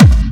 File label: Bassdrums Hard, Hard Kicks; Vengeance - Essential Clubsounds Vol.2